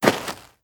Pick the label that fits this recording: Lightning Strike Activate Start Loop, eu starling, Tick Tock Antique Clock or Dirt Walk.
Dirt Walk